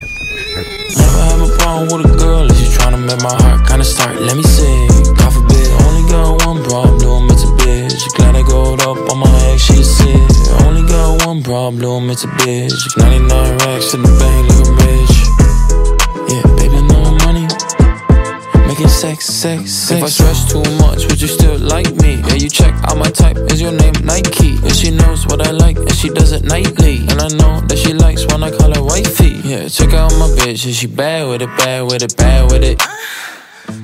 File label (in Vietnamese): nhac chuong